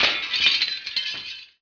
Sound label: glass; BESTSHOT; noisybit